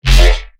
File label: bass hit Happy Whomp F; Virtual Riot